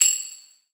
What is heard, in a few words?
Pickup Coins; Pickups